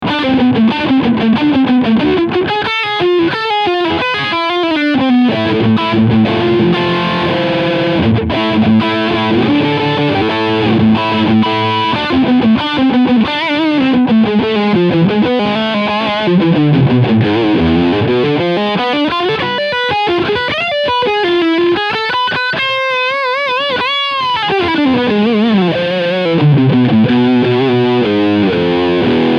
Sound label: Lead High Gain